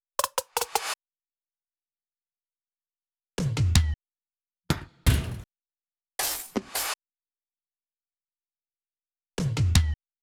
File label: fills; looperman